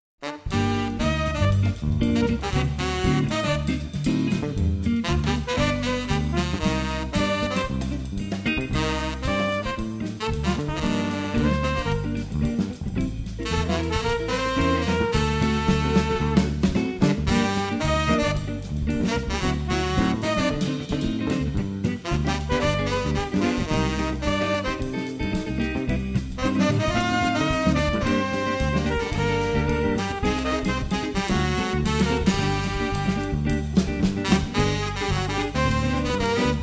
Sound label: Quintet de jazz